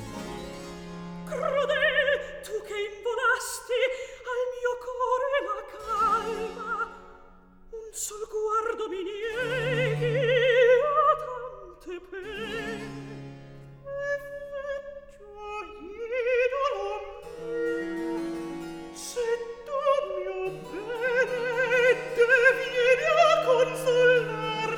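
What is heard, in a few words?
Recitativo, Handel opera; Act 2; Crudel, tu ch'involasti; Handel - Rinaldo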